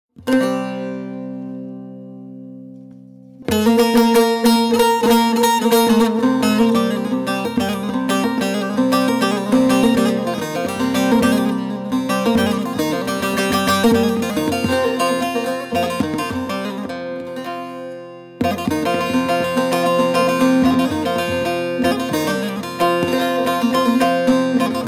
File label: BAGLAMA